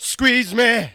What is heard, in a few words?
VOX SUNG; AMG Megabass REMIX! VOL-2